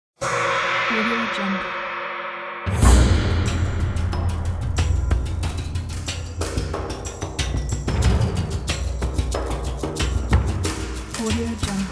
ceremonial ritual taiko cinematic percussion

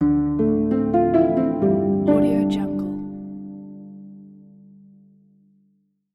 thoughtful reveal

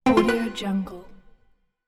alert

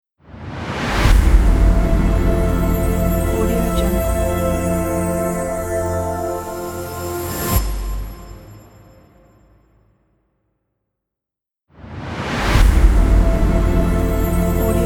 atmospheric pad logo

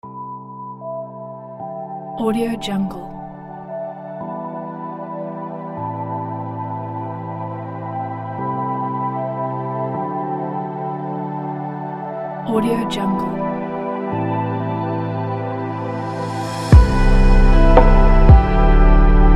ambient chill

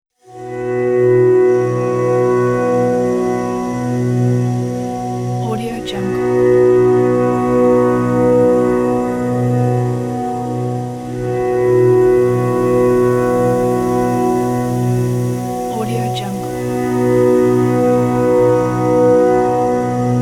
ethereal rain meditations; ntcompositions

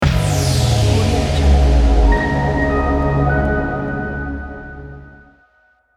bold positive logo jingle

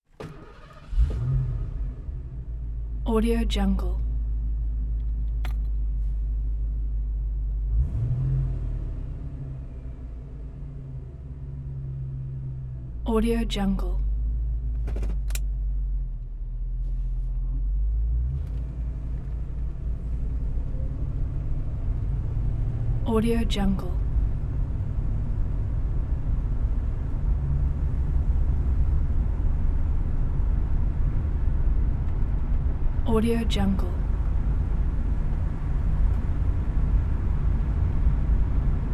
1990 ford mustang car interior start; prosoundfx

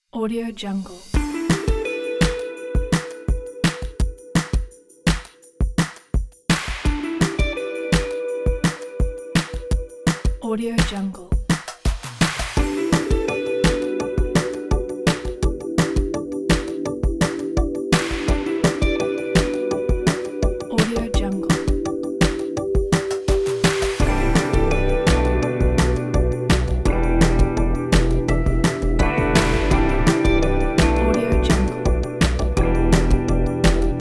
uplifting chill motivation

